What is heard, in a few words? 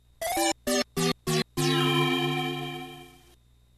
Fanfare